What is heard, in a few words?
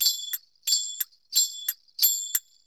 Percussion, Indian